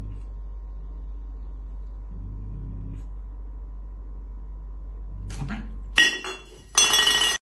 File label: arguing cats; audio meme